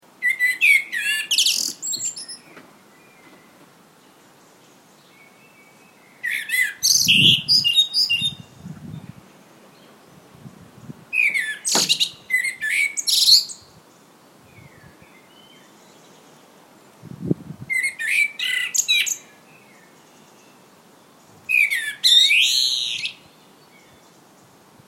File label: Birds